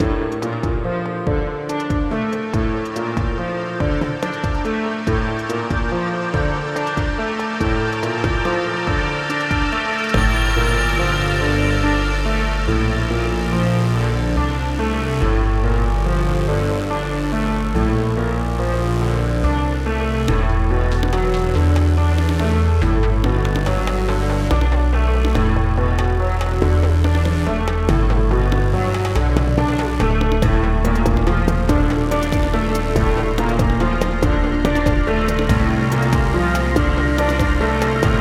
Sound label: Underwater Cave; Vision Soft Reset